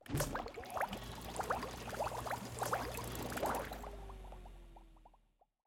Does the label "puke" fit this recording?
No